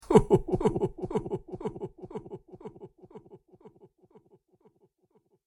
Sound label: Ho Ho Ho Laughing With Delay; Laughter Sound Effects